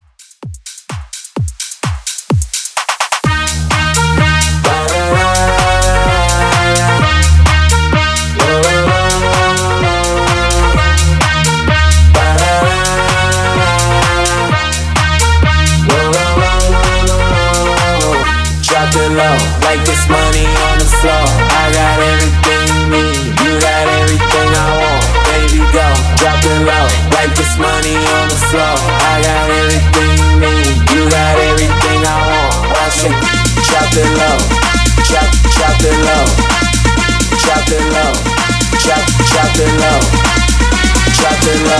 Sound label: Bootleg Mixshow 128 BPM